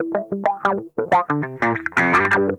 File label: CRO MAGNON, Funk Guitar; Zero G - Funk Guitar